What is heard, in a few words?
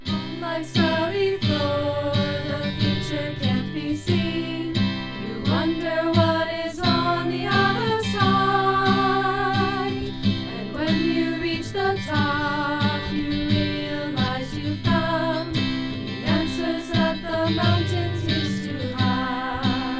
Song with guitar